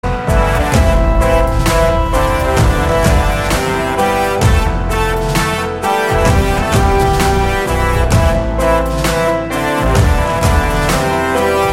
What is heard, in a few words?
Intro BGM Tone